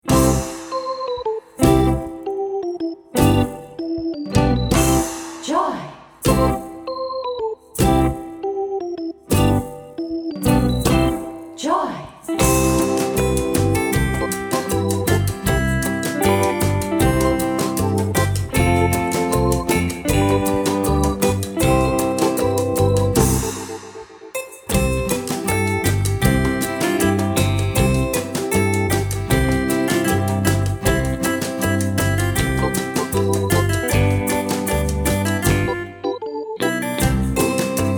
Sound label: joy to the world instrumental